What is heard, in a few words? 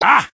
soldier taunt pain; bos; video games; source engine; tf; taunts